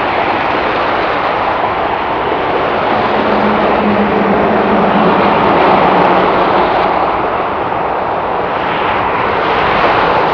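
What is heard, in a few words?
LA FREEWAY, Transportation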